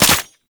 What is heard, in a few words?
Concrete Archgun Low Clip; Warframe; Archwing